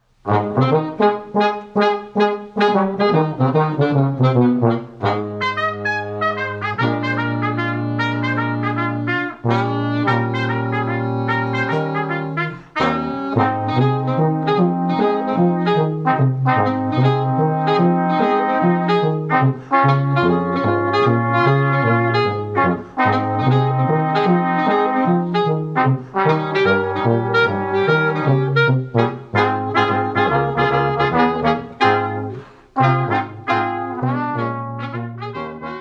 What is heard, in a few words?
Jazz; UndoneSymphony